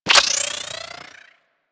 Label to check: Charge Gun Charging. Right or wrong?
Right